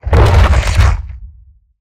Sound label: Roll Surface End; Evolve; Behemoth